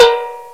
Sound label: STEEL, MALLETS; FAIRLIGHT CMI IIX